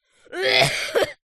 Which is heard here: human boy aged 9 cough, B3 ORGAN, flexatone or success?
human boy aged 9 cough